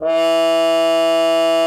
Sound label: CUP MUTE; Xsample VOL-3